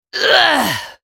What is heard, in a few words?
dying; Nidalee; Character Voices - English